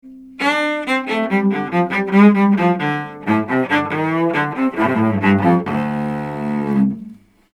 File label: avantone ck 7 Cello Cardoid